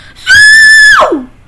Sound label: giant big boy scream